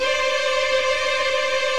VIO MAJ TRIL

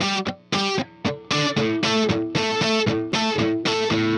Riff Guitar, Indie Rock; Sonic Foundry (Sony Creative Software); Indie Rock Anthems; Session 06 - Haze

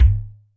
BD udu, Electric Kick, Yamaha DTXpress

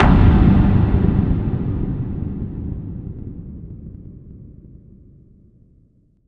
FX Kicks

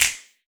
Group Snap; Roland BK-5; Claps & Snaps

